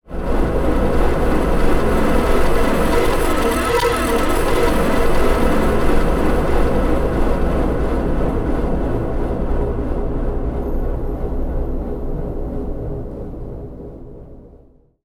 recognize me now fwd rvrs, Cinematic Metal Impacts